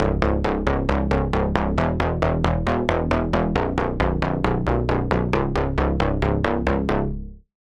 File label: Bass; Retro Hero; Routed Sound; Vital Expansion - Reality Maker Vol.1